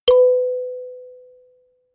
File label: notification kalimba octave high